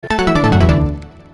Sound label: game over sound; mta